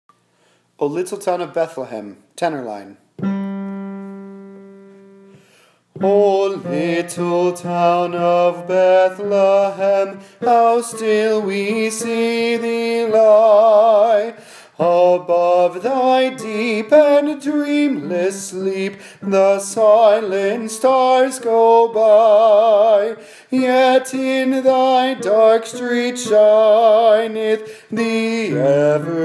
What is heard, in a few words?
tenor oh little town of bethlehem